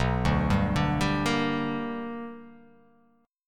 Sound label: B Minor Major 7th Sharp 5th, chord